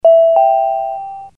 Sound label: dingdong